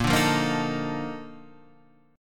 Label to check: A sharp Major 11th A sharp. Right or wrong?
Right